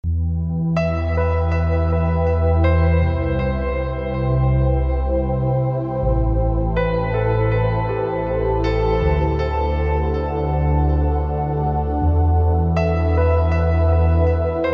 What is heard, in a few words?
lost piano ringtone